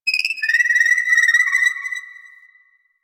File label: Drifter Camp Rock Critter, Creatures, Ambience; One Offs; Warframe; Quests; The New War